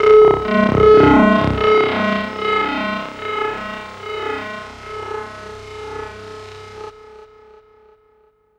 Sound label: FX, Drum & Bass